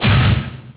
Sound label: explosion sm; outpost